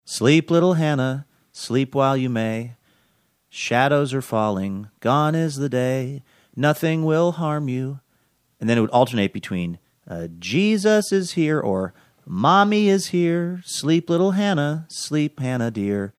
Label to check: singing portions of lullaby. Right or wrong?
Right